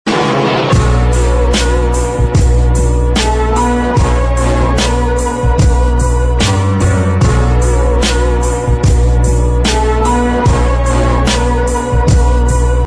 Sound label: instrumental